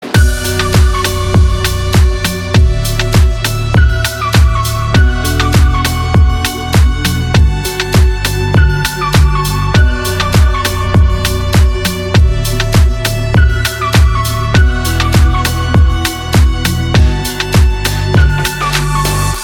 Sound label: organ